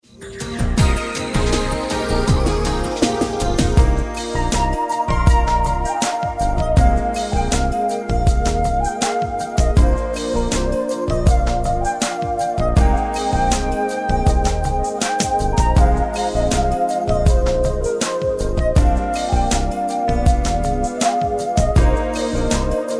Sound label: Native Trance